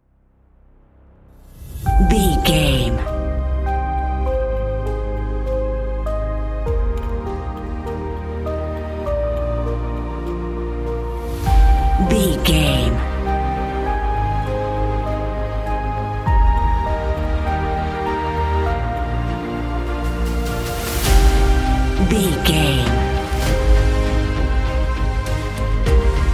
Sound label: epic trailer intro